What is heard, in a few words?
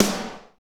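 snare, Techno Rock; Northstar; Drumscapes Roland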